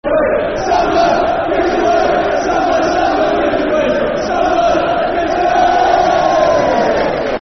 Samba Give Us A Wave, Football Chants (Soccer Songs); Blackburn Rovers Brfc